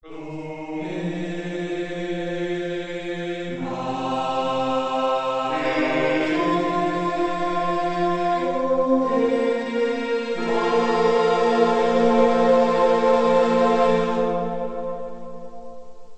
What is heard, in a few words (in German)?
chor